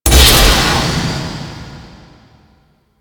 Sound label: Gas Storage Tank Start; Ambience; Props; Warframe; Corpus Gas City